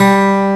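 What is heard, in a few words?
acoustic guitar G2; EL-GUT G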